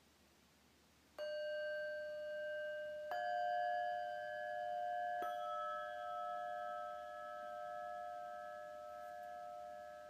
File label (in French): Bols Zen